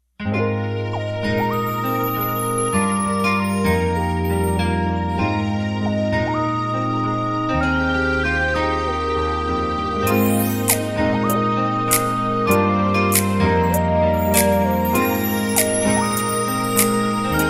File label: pop; relationship; inspiring; uplifting; empowering